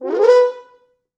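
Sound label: French Horns; East West; Quantum Leap Horns Sax; 4 FrHorns FXMOD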